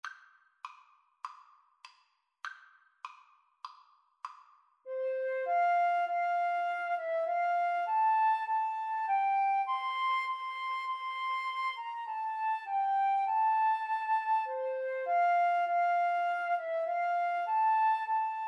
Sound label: flute saxophone duet